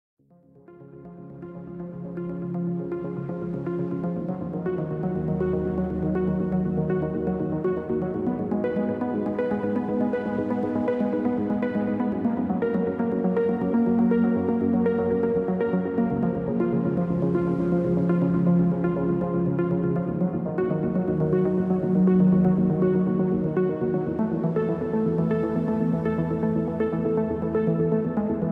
Main menu BGM; Nemo Do

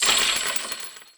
Aquarium Recycle

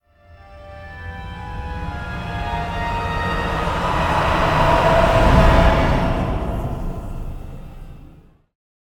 the horror within

